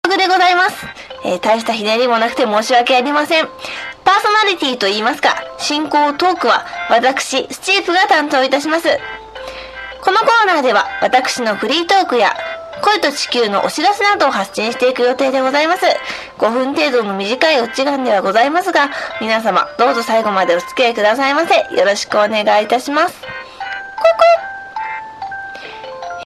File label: am radio